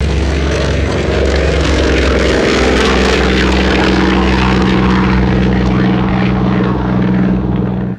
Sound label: vintage propeller airplane taking off; aircraft